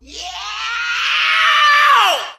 "yeahow"; ooh ahh

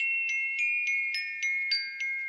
keys tingling, Phonky loops

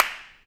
crage, Clap n Snap, Snares

